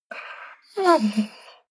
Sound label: dog whine low; natur-tiere; hunde